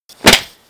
Face Punch

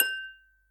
Notification Sound

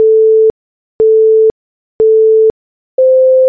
Start Beeps

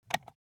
Switch Light

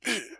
Damage

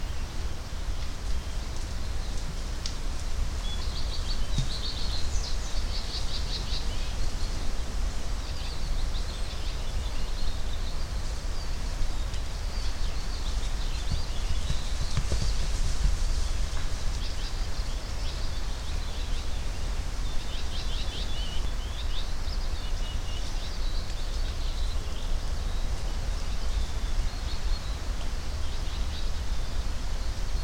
In A Little Forest

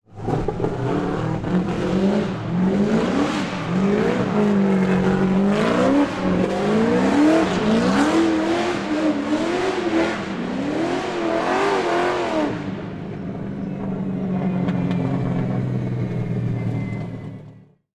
Tuned BMW Speeding Up

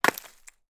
Impact Ice Moderate

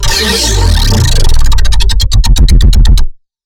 Color Bass

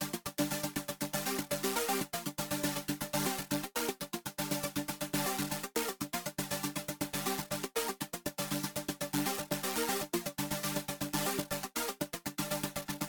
Chordline

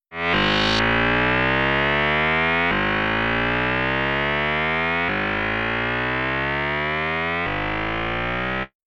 Motobike

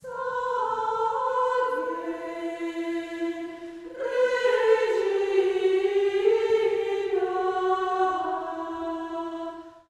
Choir Salve Regina

